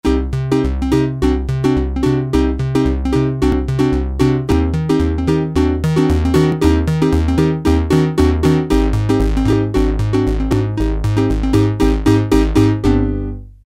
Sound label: Yamaha TX81Z; DigiAnnie